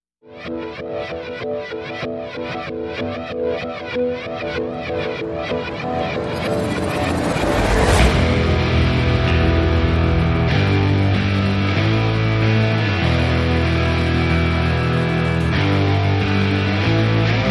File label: No Drum Kit